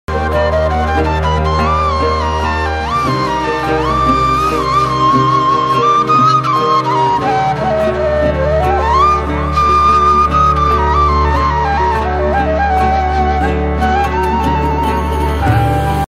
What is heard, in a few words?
Turkish flute; فلوت ترکیه ای